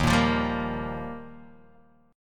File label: Eb Major Add 9th Eb down Guitar DADGAD